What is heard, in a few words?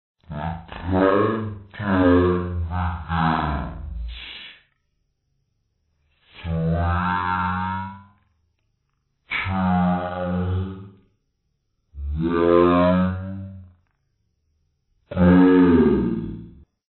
countdown